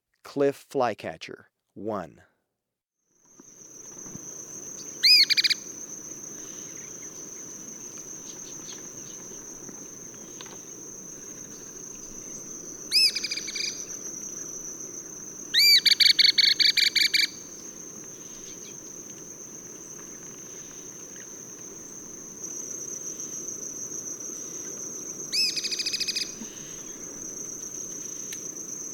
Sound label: Cliff Flycatcher; S. America